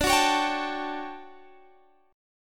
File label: D Major 11th Guitar Standard, chord; strummed; JGuitar